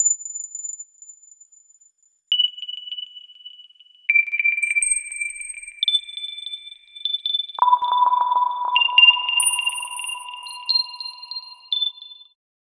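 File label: drum loop; ゆめ2っき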